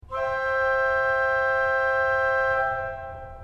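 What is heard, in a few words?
octav 4 C, organ; srednja vas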